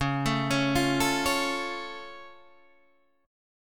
Db Augmented, down, Guitar, Standard; arpeggiated; JGuitar

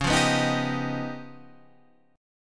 C sharp 9th Flat 5th C sharp Guitar Drop D; down; chord shape

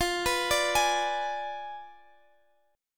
F Diminished 7th, Guitar, Half Step Down

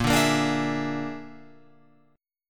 Bb 7th Flat 5th Bb, Guitar Open F; Bb7b5; strummed; JGuitar

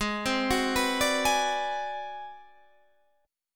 Ab 7th Sharp 9th Flat 5th Guitar Standard, chord; Ab7#9b5 arpeggiated; JGuitar

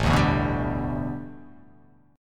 A 7th Suspended 2nd Suspended 4th A

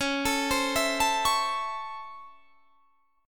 Db Minor Major 7th Sharp 5th Db, chord; arpeggiated

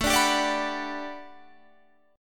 A 13th Suspended 4th A, guitar chord

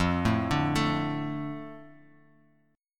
F 6th, Guitar, Open F; F6 arpeggiated

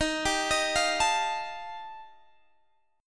G 7th Suspended 2nd Sharp 5th D sharp

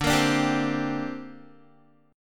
D 7th Suspended 4th Sharp 5th down Guitar; D7sus4#5; strummed

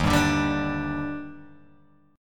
Eb Major 7th, Guitar, Open G

